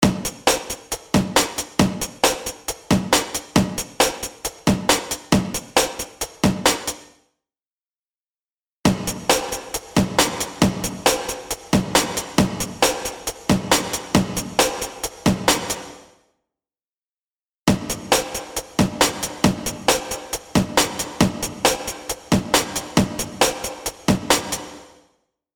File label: loop drumroom wet variations